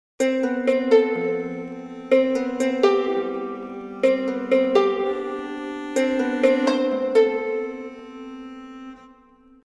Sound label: lute